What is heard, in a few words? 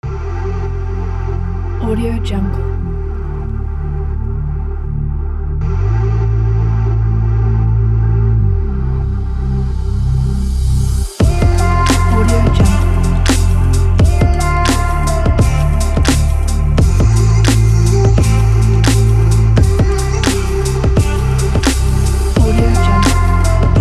chill beat